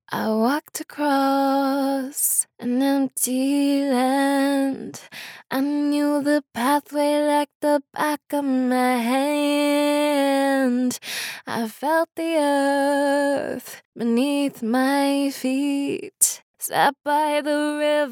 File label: Singing Sample